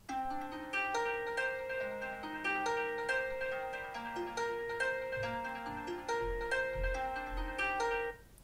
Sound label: ringtone; apple iphone 5